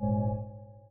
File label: Affirm